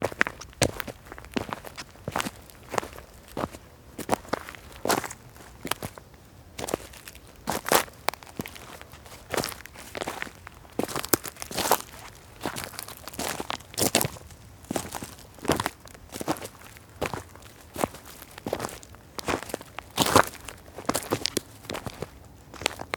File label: Walking On Stones